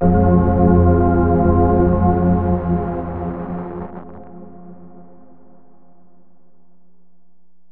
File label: Tonal FX Fm 70 Bpm, Granular Tonal FX, Lo-Fi Kit; HipHop; Ghosthack; Advent Calendar